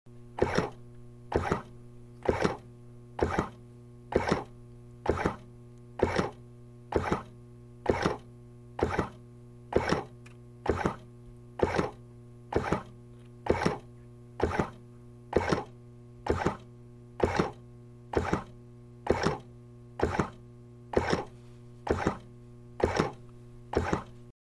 Ventilator Hum Buzz, respirator

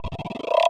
synth efx rise

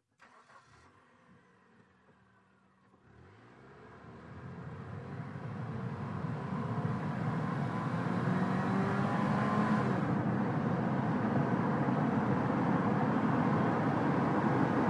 Sm Car Accel 100mph, compact

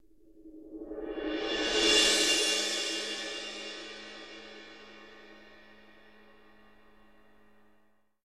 Stinger Cymbal Roll

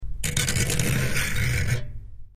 Metal / Plastic Scrape; Plastic Scraped On Metal Deck.

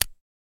Zippo Lighter Close; lighters & matches

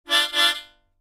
Mouth Organ Shrt, harmonica; woodwinds

